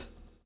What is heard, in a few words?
SWNG